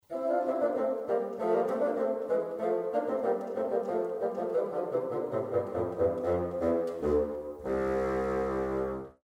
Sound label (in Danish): Fagotter; Træblæsere